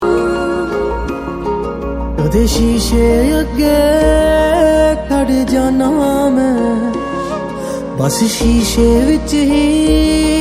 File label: Punjabi song